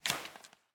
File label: Remove tv; miside demo windows gamerip 2023